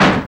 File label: SNR INDUS, Snares; Roland - Rhythm Section; Snares 5